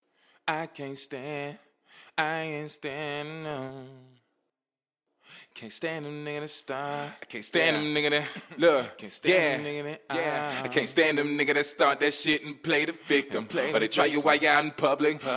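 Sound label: hip hop rap sing, 98, A minor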